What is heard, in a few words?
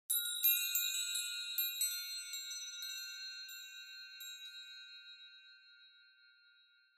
Cluster Chimes Gentle Short